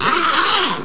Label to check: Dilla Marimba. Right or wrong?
Wrong